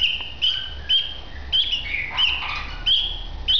junger wellensittich bettelt

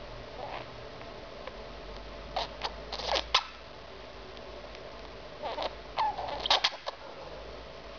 husten wellensittich

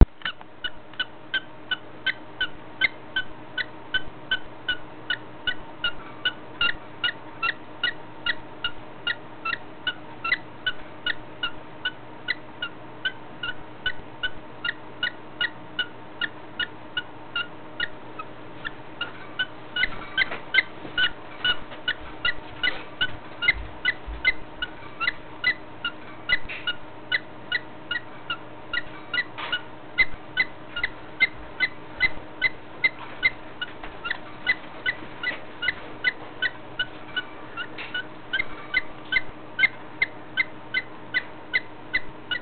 schweres atmen wellensittich

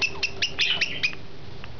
drohlaut wellensittich

